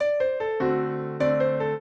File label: piano; Mozart